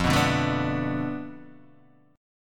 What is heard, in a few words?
F 6th Flat 5th F down Guitar DADGAD, chord